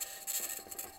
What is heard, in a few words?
mic, mars2020 edlcam